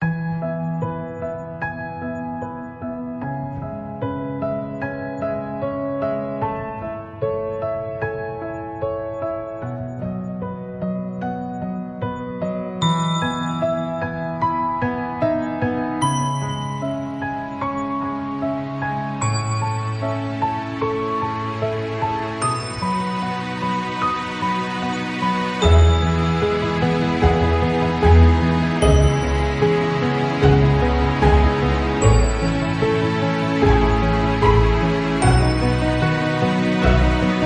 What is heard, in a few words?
Magic lovely romantic piano